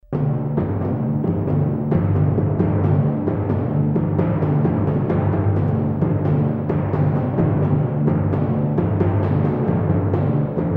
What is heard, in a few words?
timpani